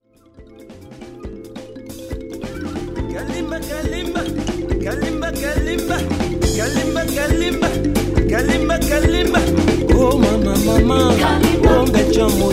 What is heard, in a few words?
kalimba kalimba hi